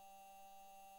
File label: mic, mars2020 edlcam